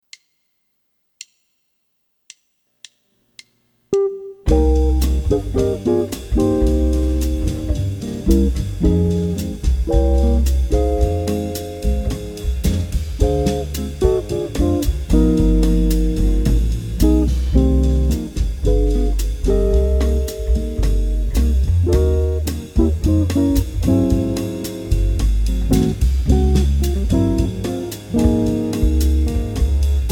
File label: Chord Melody; jazz chords